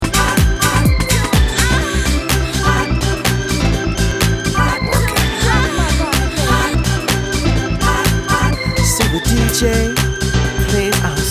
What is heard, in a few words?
Vox